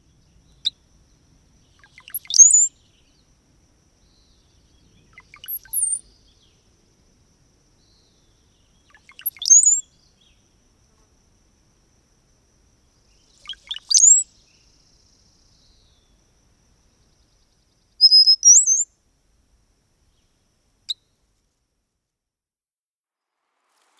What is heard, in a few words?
Brown-headed Cowbird